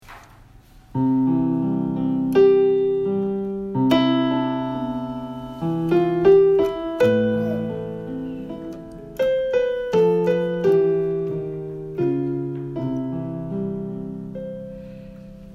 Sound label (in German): Come In Klavier